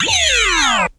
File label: phase down, Zaps and Beeps